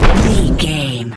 energy blast small

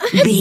voice female effort jump action

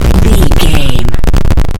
retro explosion bass

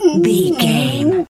bear pain whimper

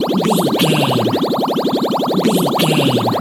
potion bubbles brewing loop

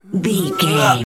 voice male effort grunt long

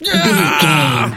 voice male death

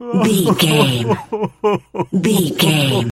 voice male cry low

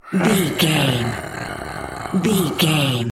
zombie voice groan

